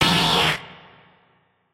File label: cry